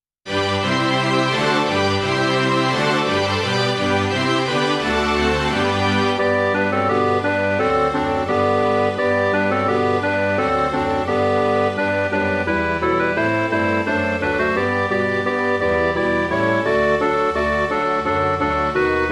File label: midi